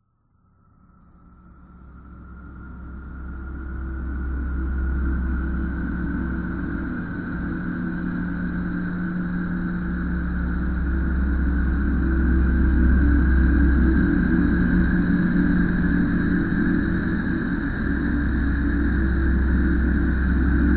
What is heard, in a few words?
science fiction atmosphere weird airy sucking style top end droning; zapsplat